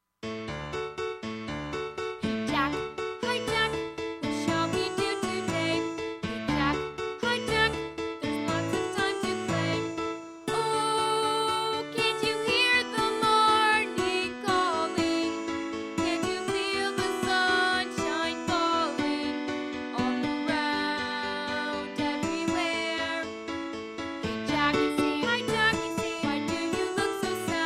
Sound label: Vocal; Hey Jack